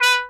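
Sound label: Piccolo Trumpet staccato B3; DirectWave; Varazdin Orchestral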